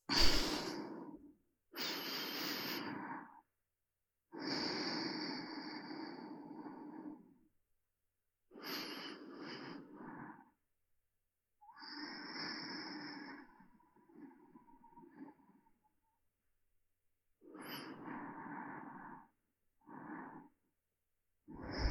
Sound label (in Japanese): 寝息; SEなし